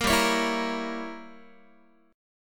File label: G Diminished 7th, Guitar; Gdim7 strummed; 13,15,16,14,14,x